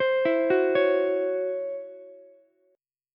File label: C sharp Minor Major 7th Double Flat 5th Ukulele; C#mM7bb5; arpeggiated; JGuitar